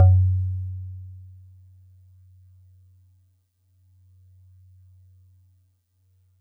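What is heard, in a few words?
Celeste DX100 F#1; Pristine Celeste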